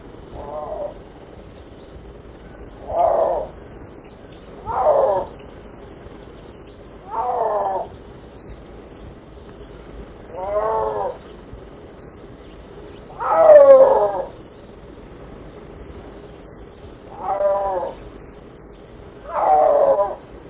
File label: Uncia uncia hauptruf